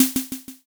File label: snare